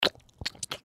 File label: gecko lick; npc